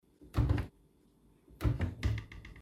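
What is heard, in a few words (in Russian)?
bottom drawer under the sink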